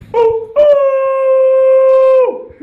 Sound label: ouh ouh